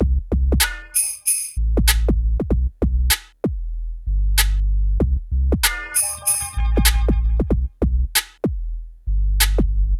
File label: PHATT BEA, Hip Hop